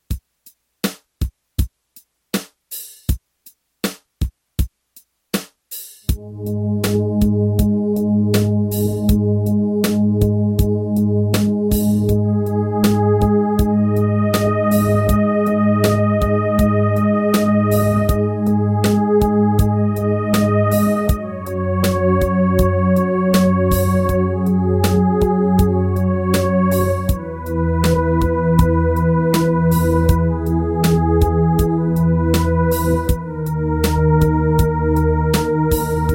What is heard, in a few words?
KB Slow Mix; Tron Legacy